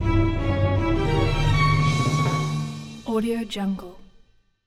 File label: orchestra crescendo; blast drive 30; prosoundfx